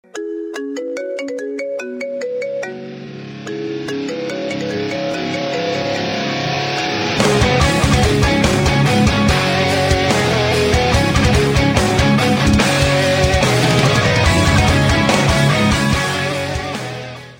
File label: iPhone Metal; nhac chuong